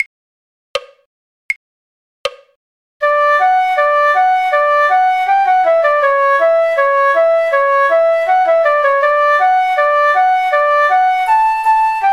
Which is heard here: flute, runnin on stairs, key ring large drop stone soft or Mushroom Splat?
flute